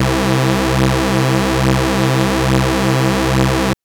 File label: Synth SyncPad c1; TrashLibrary